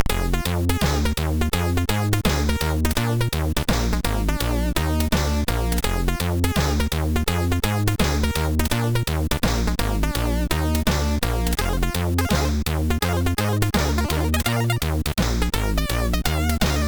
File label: Jazz Solo; SIDTunes